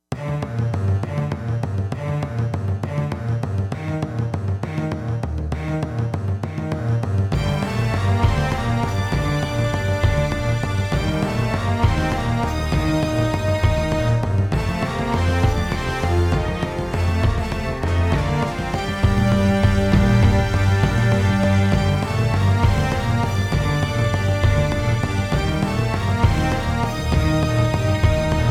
Epic TV Theme

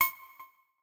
magnet start, futuristic weapons sound effects

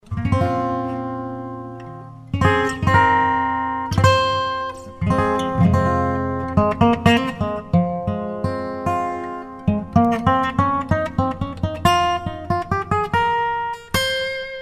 Minor With Cricket, guitar

